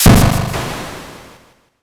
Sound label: thunder magic